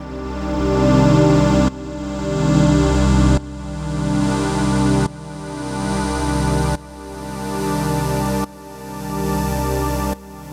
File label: Bpm142 Gliding Pad Loop Cmaj, Dubstep